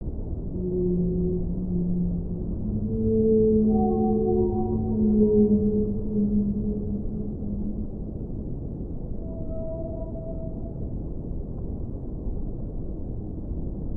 whale underwater

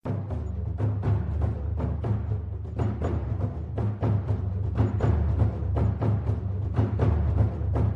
tribe drum

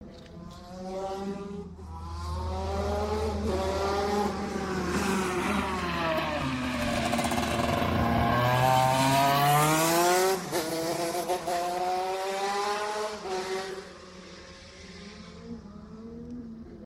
go-kart

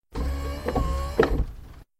rear window wiper

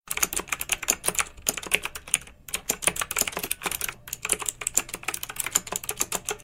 keyboard typing

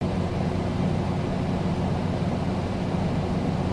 air conditioner

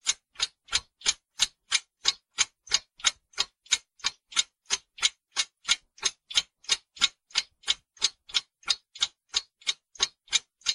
clock tick

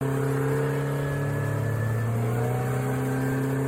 speed boat engine

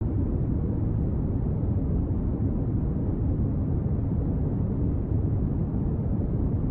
rocket engine

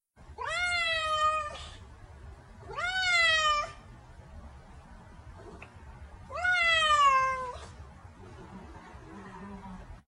cat meow

